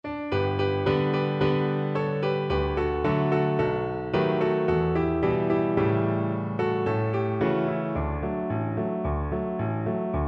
Cello

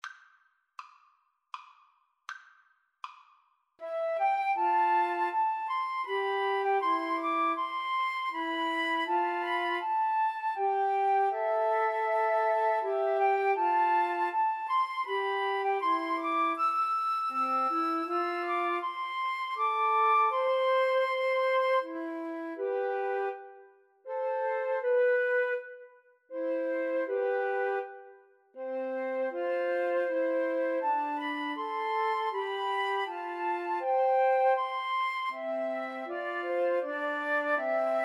holst jupiter, Flute Trio

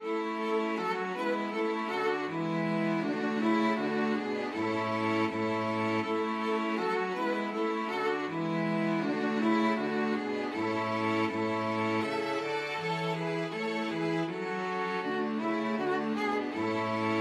STRQ, string quartet; good king

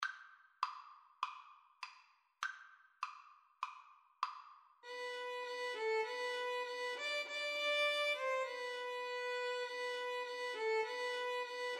violin cello duet

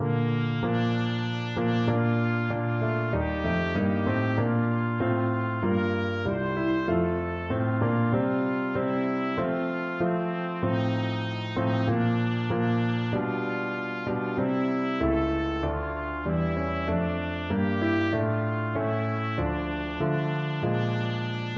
Navy Hymn trumpet

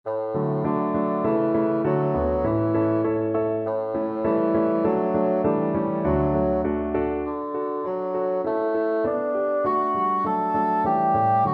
galliard sonata 4 3rd mvt, bassoon

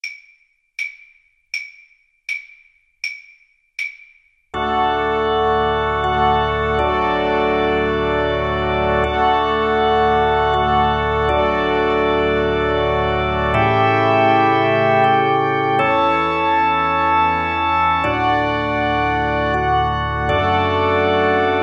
silent night violin

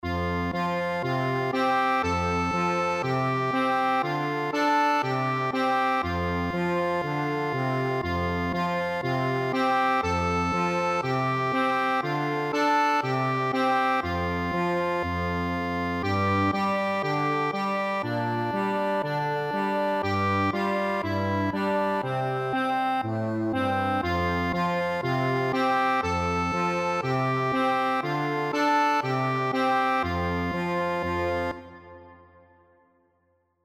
accordion

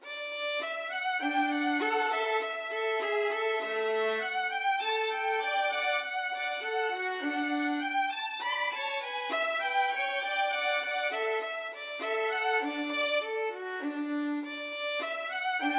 Mozart violin duet

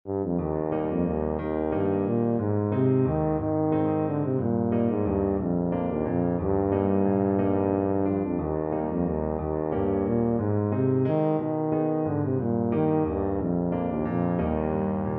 endearing, tuba

